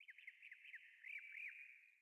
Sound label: sting songbirds; Rust